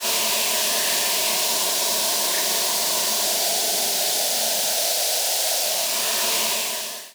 analog steam engine

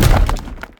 hit rock; Rapids; scrat hit rock

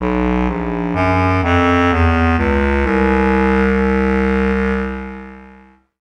Motown End

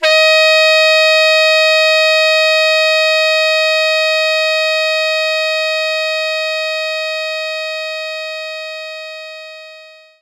Tenor Sax Eb4

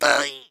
frustration; Scrat

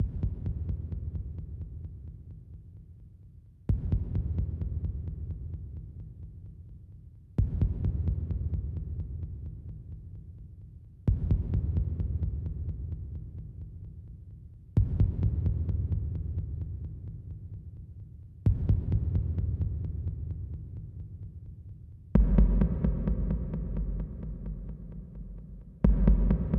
Romance Drums; Mass Effect

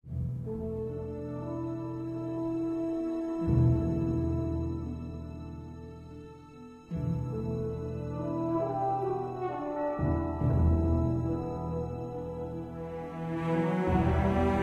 town theme; Tempest Pirate Action RPG

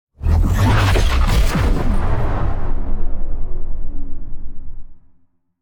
Low Warped

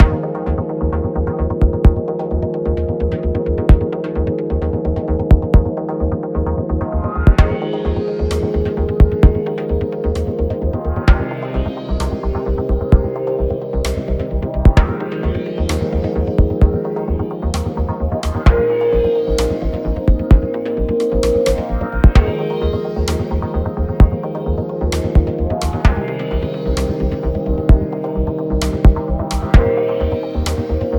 Tense; Everspace 2

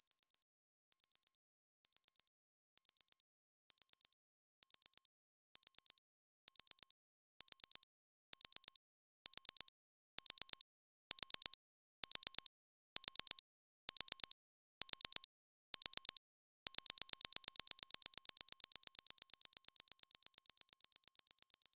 Alarm; Grounseed; PC-98

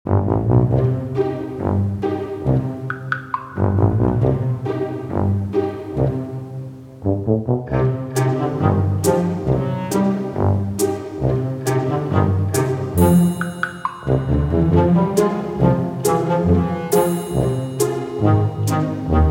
funny comedy music; eliansproductions

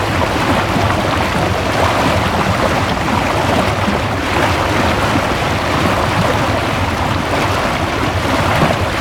swim

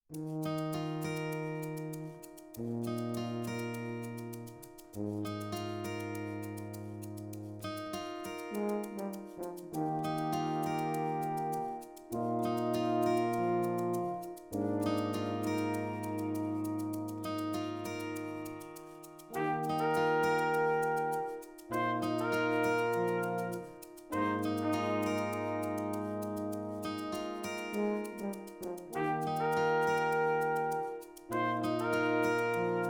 Ceremony; Animal Crossing New Horizons

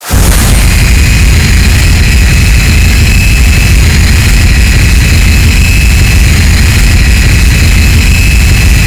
jetpack one shot

